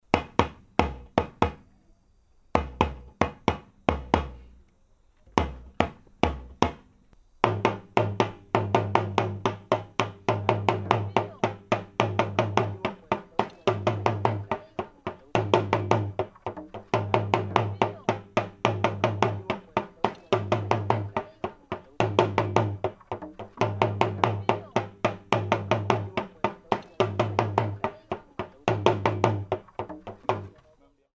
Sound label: brekete